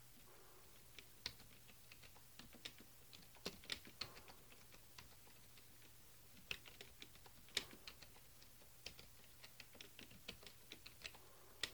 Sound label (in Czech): HP oem, klávesnic; HP Standard Keyboard DT527A